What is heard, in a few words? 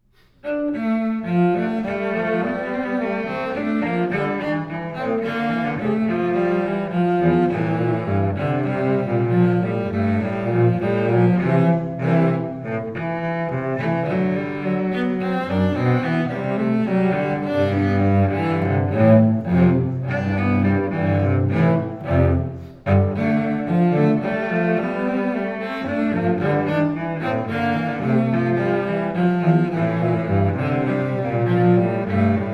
five duos for two cellos allegretto